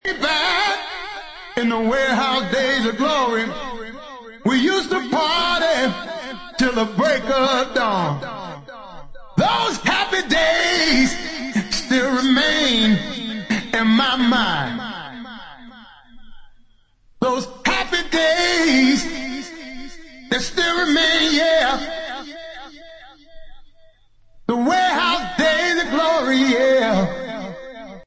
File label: acapella mix